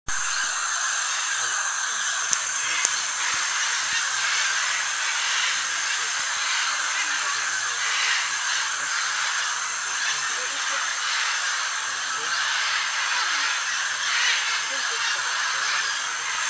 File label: claylick, peru; filme